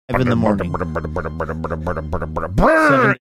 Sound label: making sound of motorcycle idling revving